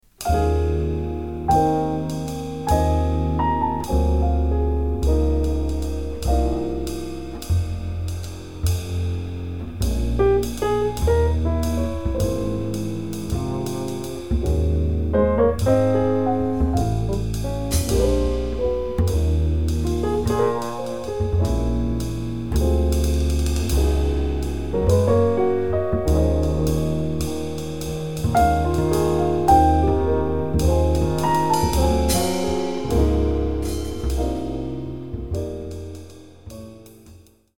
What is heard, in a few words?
wedding waltz trio